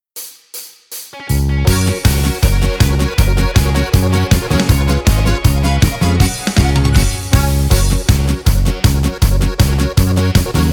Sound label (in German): die Berge ohne Chor